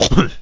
piglette collision; Angry Birds Space

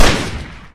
Pistol Fire

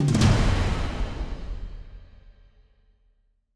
Stinger; Return to Monkey Island

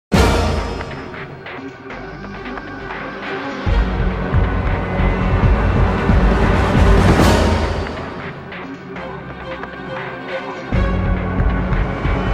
enemy contact; Resistance Fall Of Man; ps3

